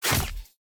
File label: Concrete; SNKRX